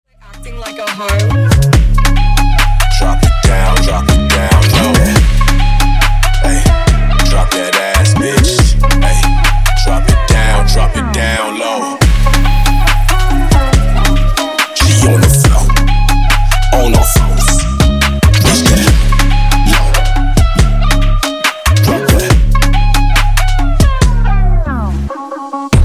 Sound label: Dirty 70Bpm